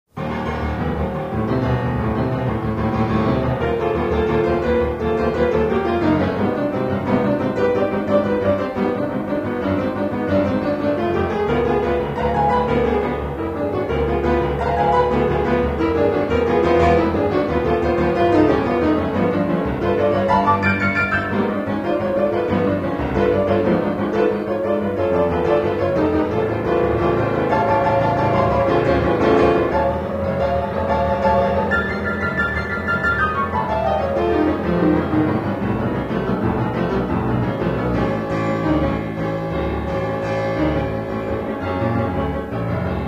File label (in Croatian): Klavirska svita